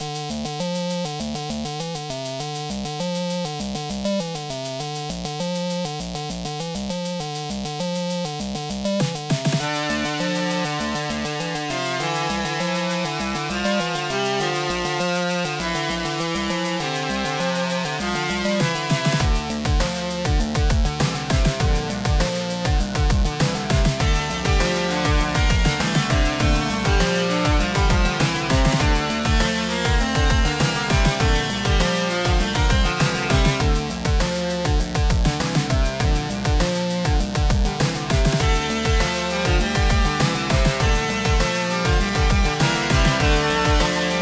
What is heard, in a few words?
arbitternon metal